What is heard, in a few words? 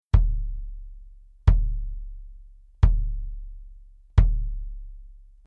BASS Soft Felt